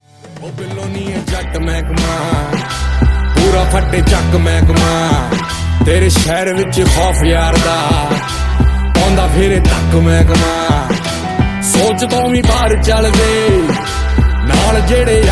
RINGTONE, PUNJABI SONG

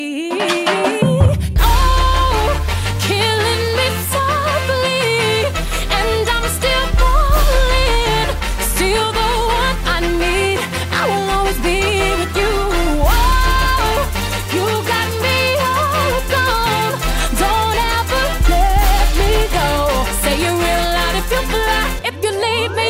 COUNTDOWN RINGTONE

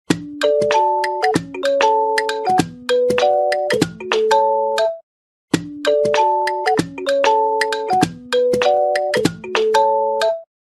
game OnePlus official game ringtone

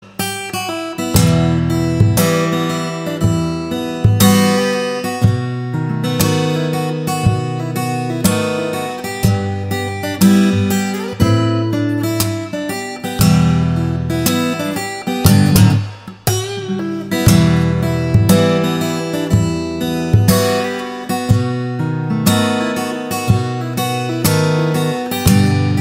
GUITAR RINGTONE, ACOUSTIC GUITAR

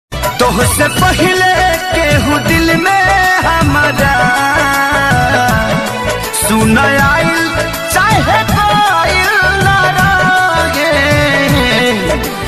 Bhojpuri sad status ringtone

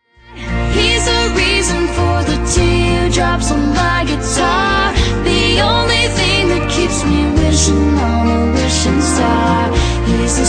GUITAR RINGTONE, ACOUSTIC GUITAR

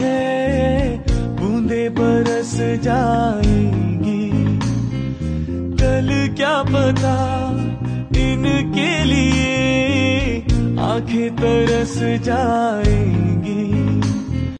RINGTONE, HINDI SONG

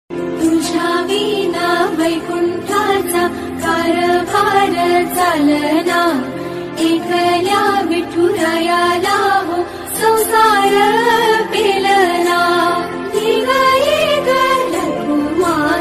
SONG RINGTONE, BHAKTI

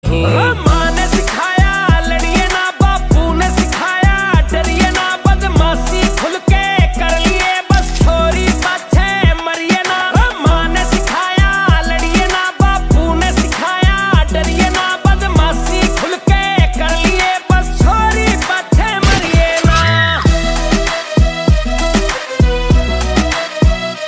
HARYANVI SONG

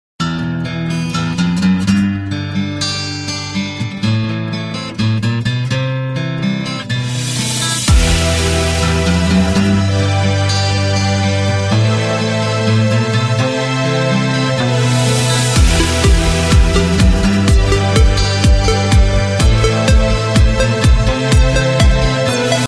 GUITAR SOUND RINGTONE

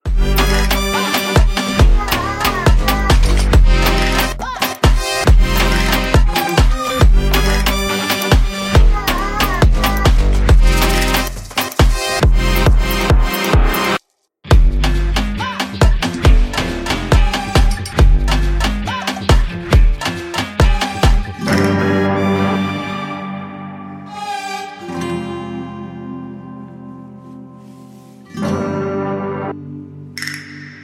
ENTRY THEME RINGTONE; REDDY

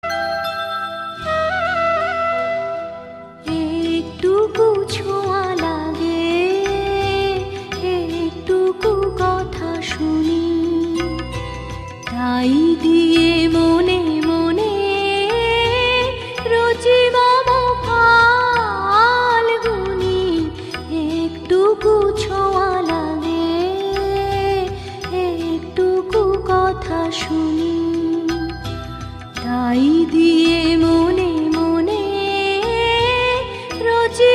BENGALI RINGTONE

